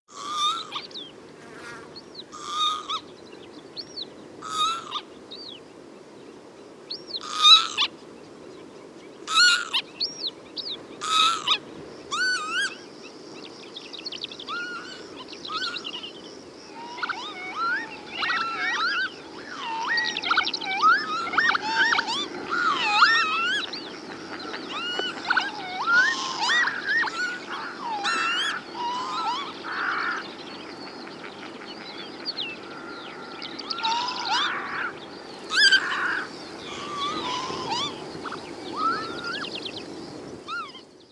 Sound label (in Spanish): avefría